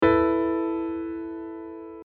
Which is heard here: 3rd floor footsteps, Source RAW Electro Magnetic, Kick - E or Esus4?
Esus4